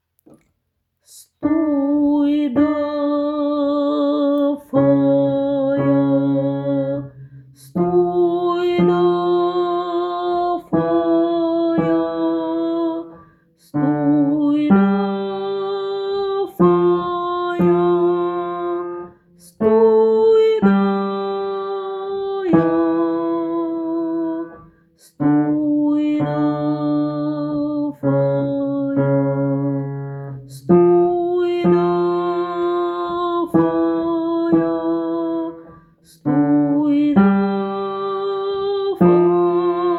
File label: Sarabande Basse